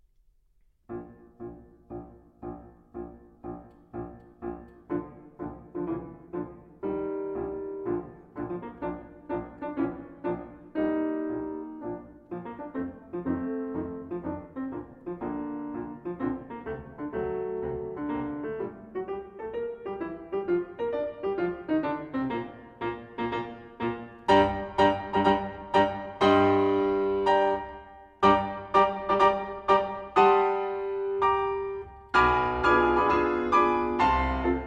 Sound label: Trombone Concerto in B-Flat Major I. Tempo di marcia, moderato- II. Theme and two varia